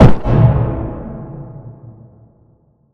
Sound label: splat player; SupaLidlGame